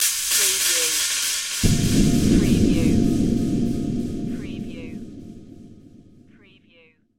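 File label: Production Element Hit Delay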